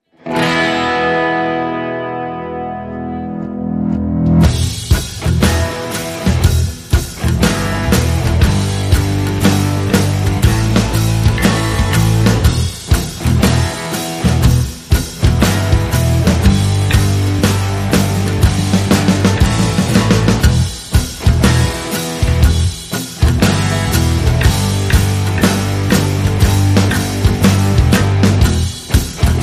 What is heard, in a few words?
No Lead Guitar